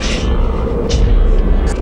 FX, Futurist Drum & Bass

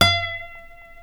F#5 nylon, Guitars

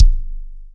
BOMBO, Percussion; MARKYDRUMS

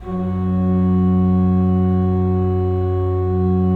3D Organs Pipe Mix Celeste; E-MU Producer Series Vol. 5 – 3-D Audio Collection

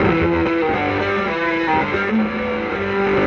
Fierce, Electric Guitar

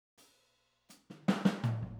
Boogaloo, Intro, Overhead, Drums, 82 BPM

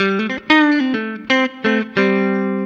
COUNTRY

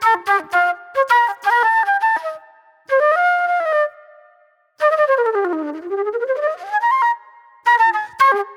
Raw Funk Flute

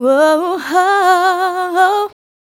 Female Vocal; Vocals; Sonic Foundry (Sony Creative Software); Mac Money

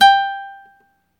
GTR nylon

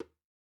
WALK DRUM, African Drums

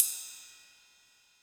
Ride Lite, Yamaha DTXpress Cymbal

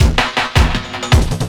Drum & Bass, 160 BPM

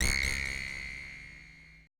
AMBI FX

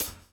DOWN, DOWN KIT 78

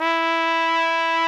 trumpet E3; Greytsounds VOL-1

